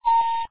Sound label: electronic ping short; video games; roblox 2007